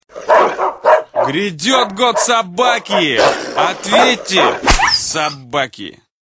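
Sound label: dogs, bark mix; Gryayadet god sobaki! Otvette sobaki!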